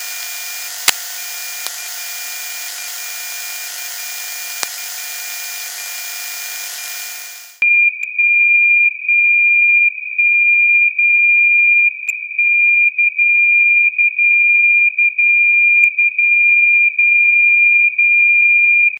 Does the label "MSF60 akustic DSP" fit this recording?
Yes